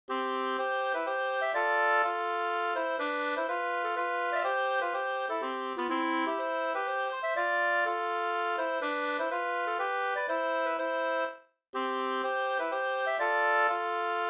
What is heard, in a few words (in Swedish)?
hamburska C dur